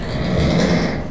EFulvus, sounds of the respective species; Lemurs

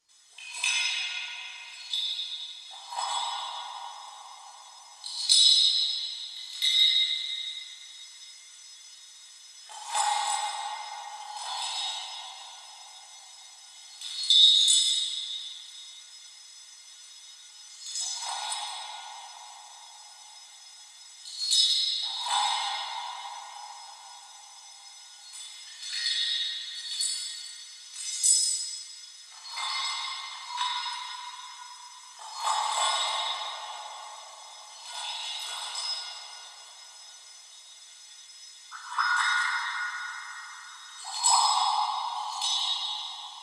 Well Glassbreak, Chalice Well

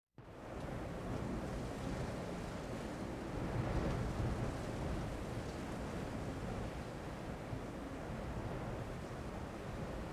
Wind 4C, Nova Scotia Outhouse

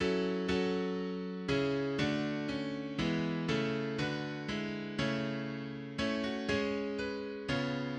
bach bass

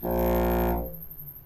Bassoon low short; Dry Sound; Convolution

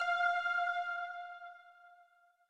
ping

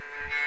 saber

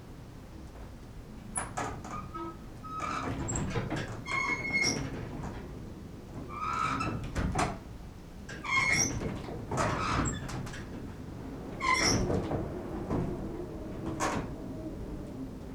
Wind Rattle, Aeolian Voices; Shed 1 Shed 2, Shed 3 (Alberta)

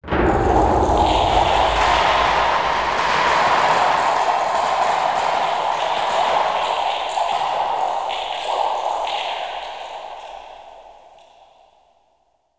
hard Rain

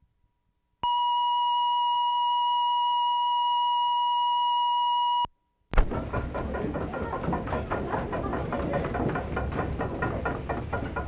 Dumping corn into hopper, mill; York, England May 31/75; RAINDALE MILL; EUROPE COLLECTION

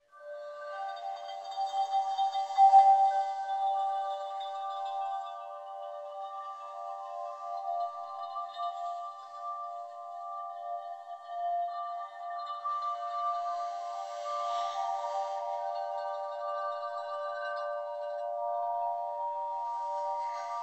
Water Chimes, Dripping Water

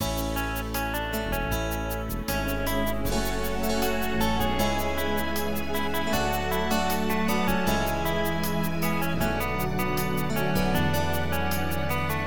stem calm drums

